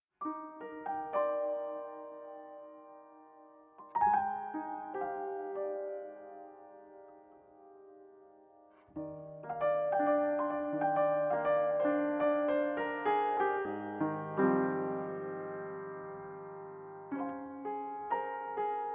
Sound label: tune